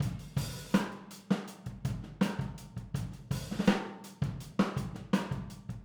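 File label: Boogaloo, Overhead Stereo, 82 BPM; Drums; Verse; Sonic Foundry; Drums from the Big Room The Mixes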